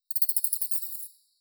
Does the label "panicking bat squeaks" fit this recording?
Yes